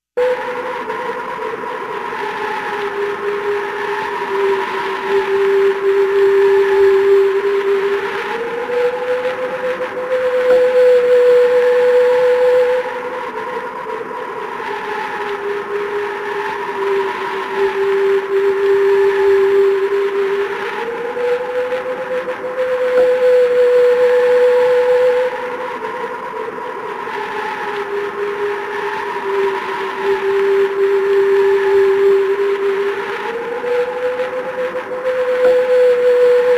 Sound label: Howling Wind; Halloween Havoc - Sound Effects; Ktel